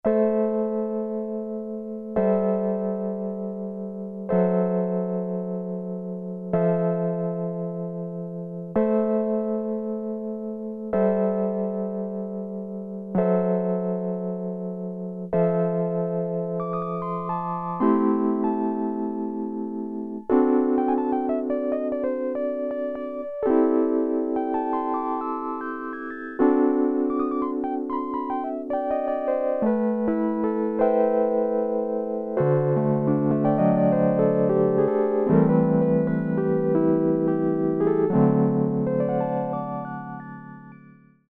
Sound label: REED PIANO, Oberheim OB-8; Factory Patches